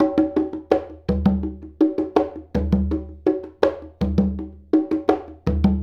Congas; Essential Percussion

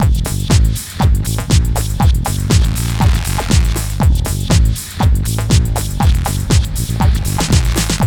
GHET ROL

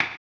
HAMBONE, Vocal Blues Soul; Spectrasonics; Planet

